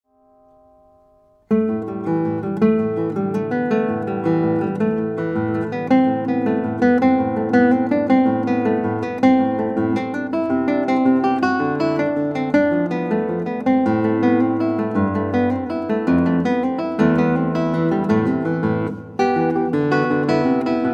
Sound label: Guitar